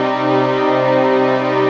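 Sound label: Pad C minor, Orchestral; Sonic Foundry (Sony Creative Software); Orchestral Series VOL-4 - Rock and Pop; Pads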